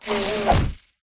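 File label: pterodactyl attack up; pterodaktilj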